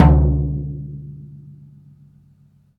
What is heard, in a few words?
Floor tom